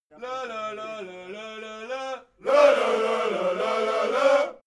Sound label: army song segment